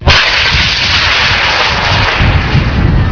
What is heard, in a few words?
rock, rockets